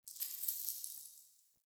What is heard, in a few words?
Idle Head Shake; Tagfer; Warframe; Ambience; Entrati